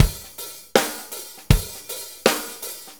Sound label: Shuffle Loop, Full Kit Loops; Drum Tools; Shuffles; Sonic Foundry (Sony Creative Software); Loops & Samples Drum Tools